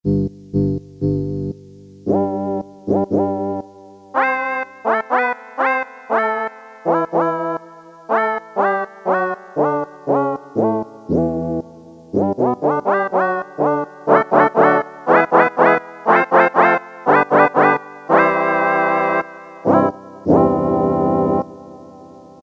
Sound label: WAW VOICE, Casio Rapman; Tones